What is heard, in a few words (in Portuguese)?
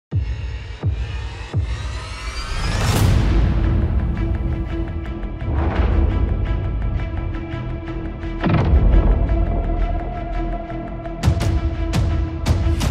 funk consciente e funk ritmado